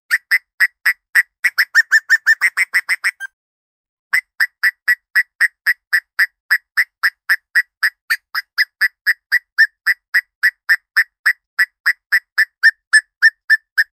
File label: gorilla and gorilla famil, calls